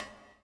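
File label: reverb walk, footsteps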